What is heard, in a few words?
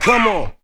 VOCALS; Ueberschall; Jam Box